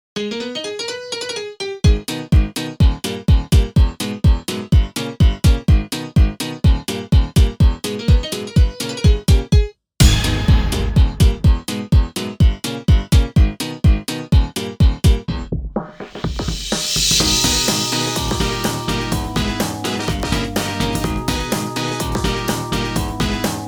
Instrumental; Friday Night Funkin' Spooky's Saturday Scare soundtrack Windows gamerip 2023

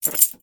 Pick Up Keys On Desk

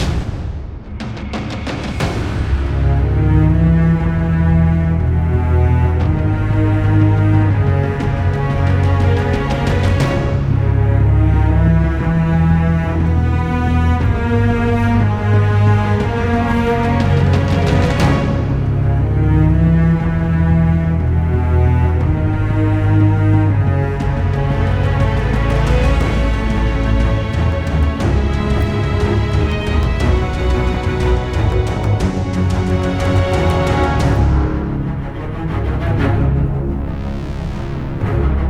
Tower Explosion; Operation Burn Water; Titan

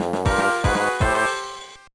Jingle; Monster Rancher Advance; gba

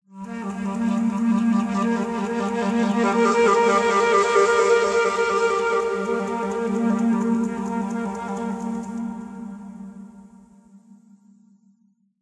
Atmospheric Mystery Flute

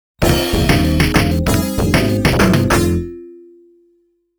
Round Clear

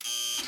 Camera Signal

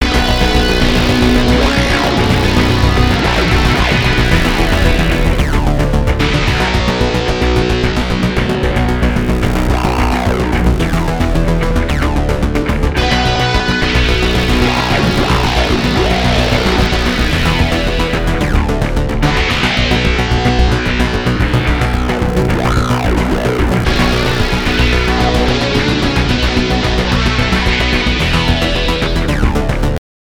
Ambient Loop; Transformers: Revenge of the Fallen; PS3; Windows; Xbox 360

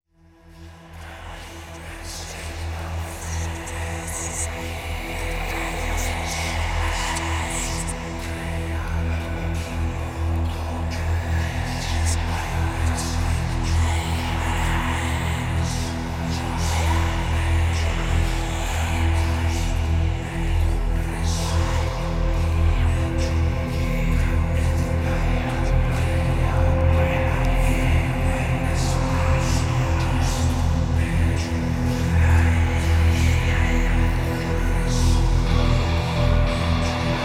Whispering Forest; Europa Universalis IV; Guns, Drums, Steel

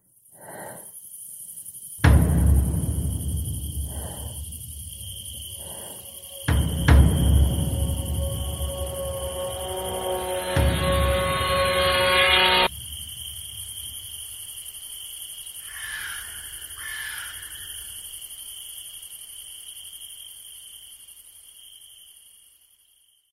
Game Over; Backyard; Granny; Android

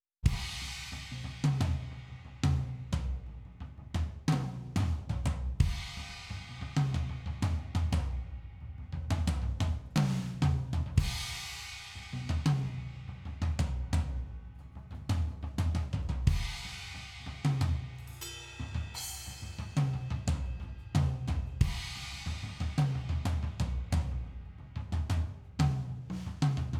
BGM Warming Up; Phase1 A

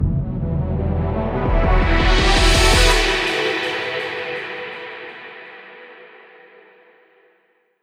Victory Theme

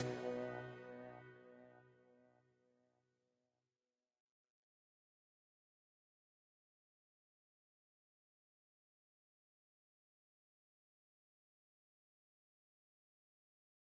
Mix Stem Layer; quantum recharged gamerip 2023

